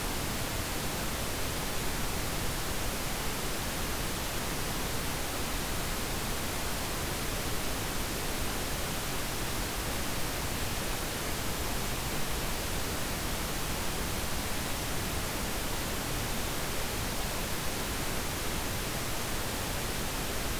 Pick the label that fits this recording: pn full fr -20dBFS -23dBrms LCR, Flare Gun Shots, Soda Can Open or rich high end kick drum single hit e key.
pn full fr -20dBFS -23dBrms LCR